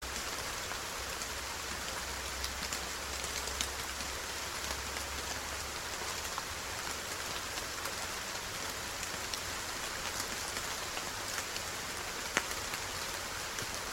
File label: Rain